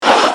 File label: iphone swish